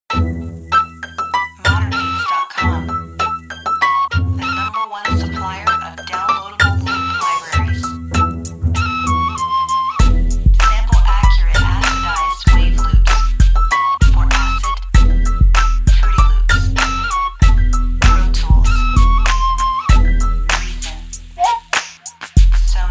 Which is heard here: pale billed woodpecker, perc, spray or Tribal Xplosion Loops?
Tribal Xplosion Loops